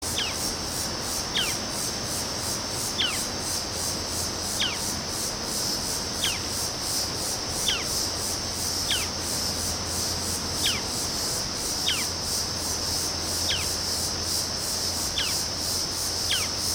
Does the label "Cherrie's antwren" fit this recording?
Yes